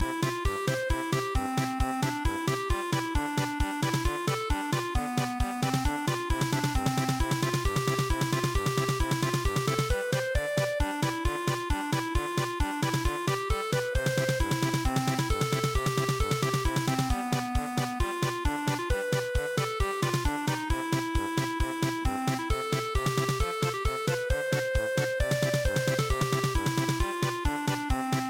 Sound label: taue polka